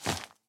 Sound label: flap